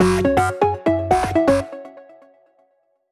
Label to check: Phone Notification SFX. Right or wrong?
Right